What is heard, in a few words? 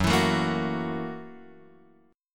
Gb 7th Sharp 9th Gb Guitar Open F; strummed; Gb7#9; x,1,4,4,1,1; JGuitar